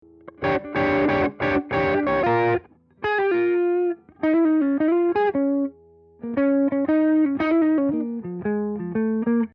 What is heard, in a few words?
guitar original